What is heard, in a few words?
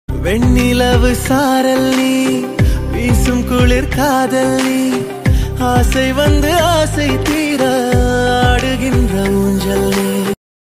bgm